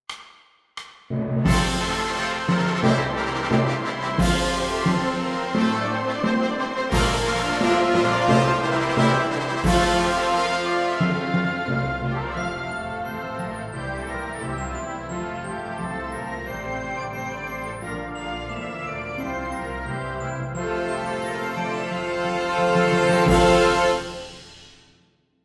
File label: Tempo 88bpm